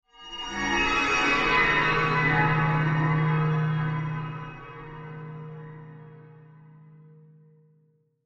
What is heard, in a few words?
Horror Swell Metal Bend; Scary Tones; Blastwave